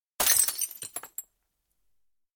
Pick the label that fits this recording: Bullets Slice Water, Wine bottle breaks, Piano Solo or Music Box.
Wine bottle breaks